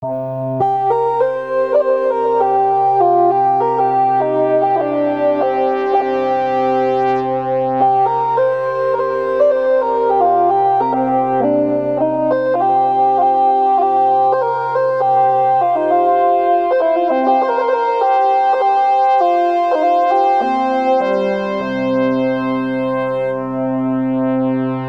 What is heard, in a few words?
Vintage Operators Leads; Angstrom